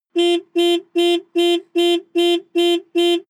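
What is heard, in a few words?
sports car exterior horn alarm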